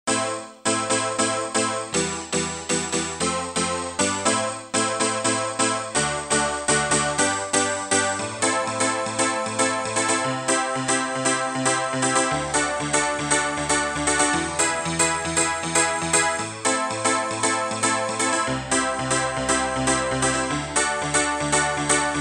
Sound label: Dance Piano; Roland M-DC1